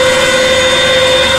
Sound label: stuka siren loop